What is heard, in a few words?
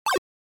NES-Action (Throw)